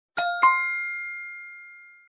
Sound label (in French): Bonne réponse validation Check